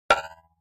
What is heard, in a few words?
Pop Up Hollow Tap Spri, pop up element